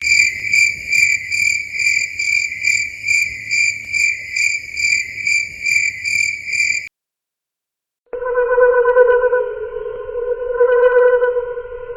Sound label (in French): chant du criquet